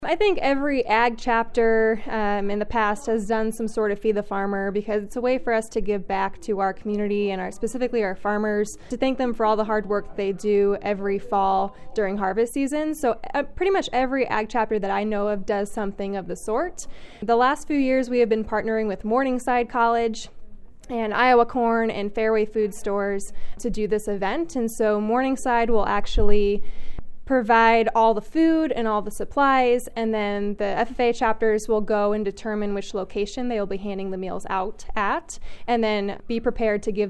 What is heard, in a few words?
Bass